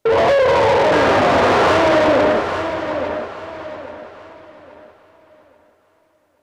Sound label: crucifix scream; Dread